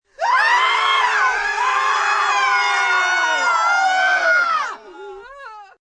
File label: Group Scream Fear, Screaming, group screaming in fear, terror (10-15 people) (human voice)